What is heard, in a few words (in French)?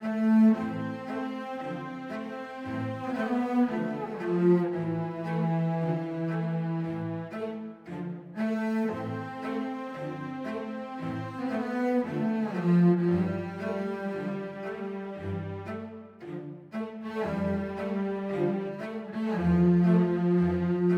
Ouverture Classique